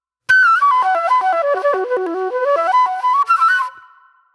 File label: Soul-Jazz Flute, Call And Response